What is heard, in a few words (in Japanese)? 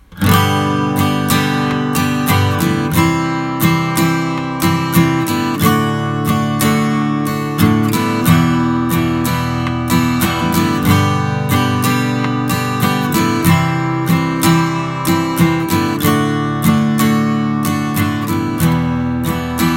G-C-D-Em-サウンド